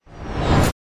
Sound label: Incoming artillery rockets no explosion